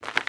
Cave Crouch; The Inn

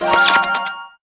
chocolate box small

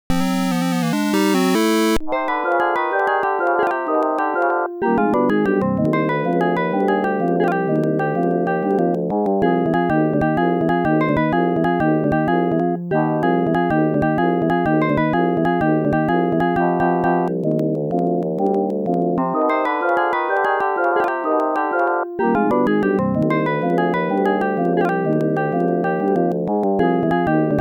~Bataille~ (Encounter ~ Battle ~ Victory)

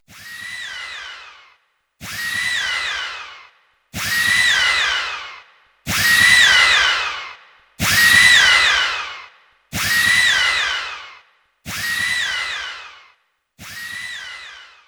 Warning; G-Darius; arcade ps1 windows

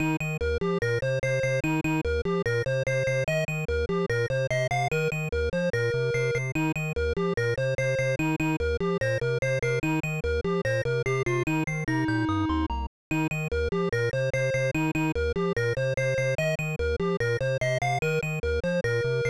Stage Music; Frisky Tom; Nichibutsu Arcade Classics; SNES

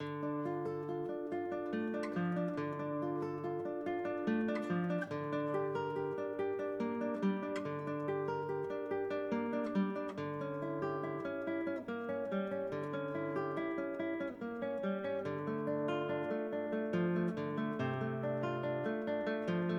Guitar

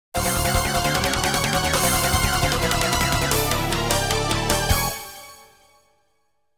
Crashed burn; assault suits valken cybernator

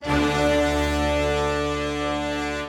Jungle End Stinger

